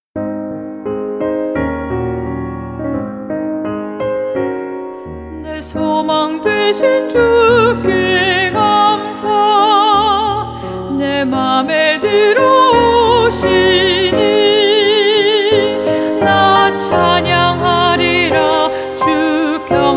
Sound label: ALTO